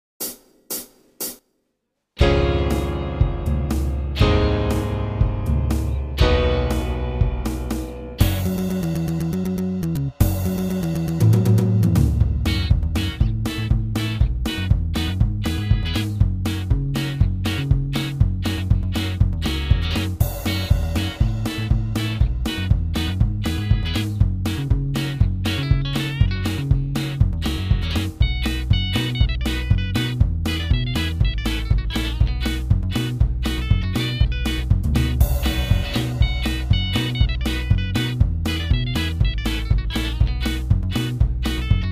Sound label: backing